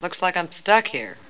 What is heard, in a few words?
lose